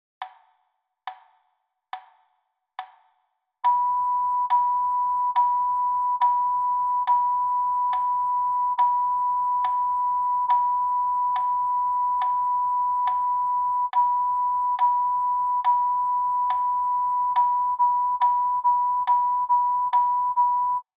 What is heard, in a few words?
Rhythm Practice B note